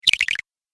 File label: Magic Tokens Game Fx; Video Game Sound Effects